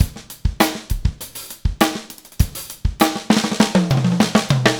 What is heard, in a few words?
Funkified, Rock Drums